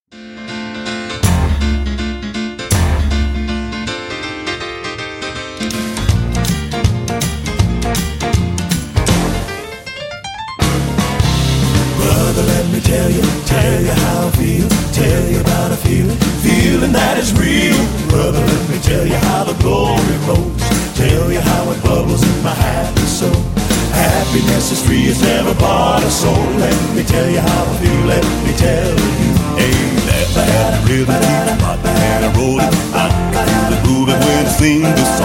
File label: happy rhythm